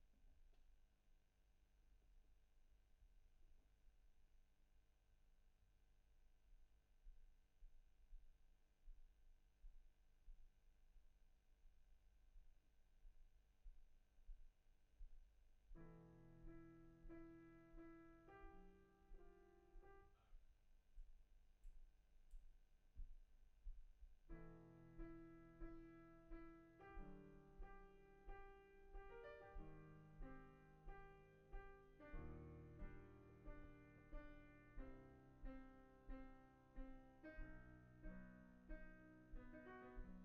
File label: GTR